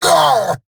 Pain, Battle Barks; Warframe; Eidolon Grineer

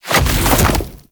Infested Splitter Jump Launch

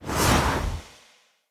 Kuva Lich Requiem Mod Open, UI

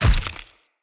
Claw Large Impact Flesh, Enemy Unarmed; Weapon Impacts

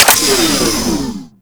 Arch Rocket Crossbow Power Down; Warframe; Archwing

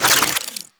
Idle Spin; Parazon

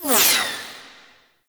Laser Fire Whiz By; Enemies; Weapons; Warframe; Tau; Sentient Dactolyst

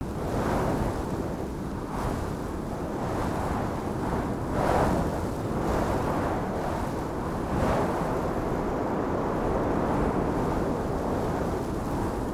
Mountain Wind Heavy Air Loop

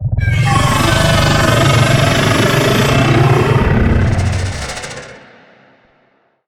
Arachnoid Camper Vocal Attack; Warframe; Corpus

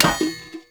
Street Sign Destroy; Ambience; Props; Warframe; Nineteen Ninety Nine

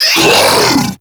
Infested Diseased Ancient Pain

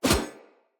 Split Sword Whoosh; Weapons; Player; Swords; Starter

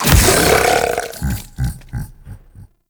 Devour Regurgitate Impact A; Warframe; Grendel

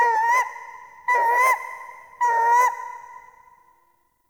Critter Random; Warframe; Ambience; Nokko Colony

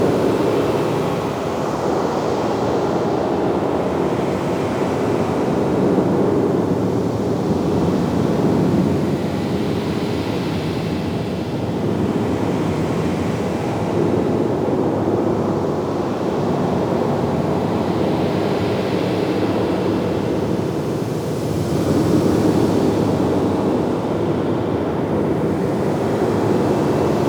Ocean Cave Ambience; Warframe; Grineer Ocean; Interior

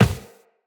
Run Default, Footsteps; Tenno

